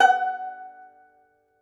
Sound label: Tenor Banjo, Plucked Strings